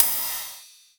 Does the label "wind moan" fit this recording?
No